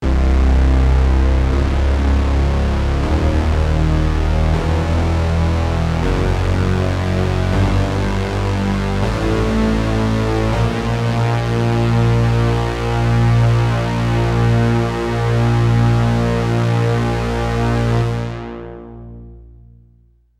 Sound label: octa scale moogerphaser